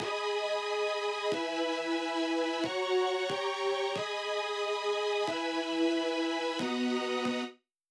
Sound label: Intro Super Saw; G♯mE – F♯ – Gdim - G♯m – E – BM7